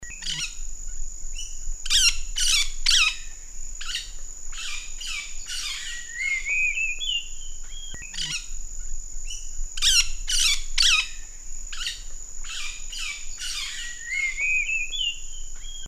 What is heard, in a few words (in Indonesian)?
kring kring burung call